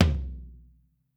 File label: FLR TOM MOD